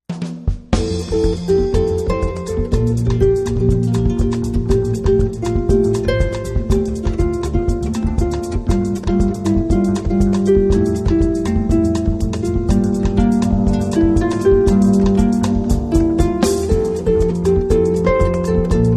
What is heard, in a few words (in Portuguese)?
harpa bossa